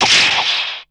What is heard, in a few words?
Door Trek; Zero-G; Total Drum Bass; Hits and Bits